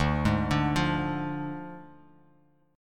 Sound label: D Diminished D; arpeggiated